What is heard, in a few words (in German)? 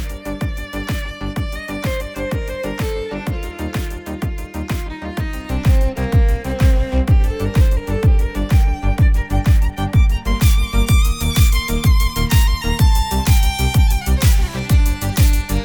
tiefen und hoehen anhebung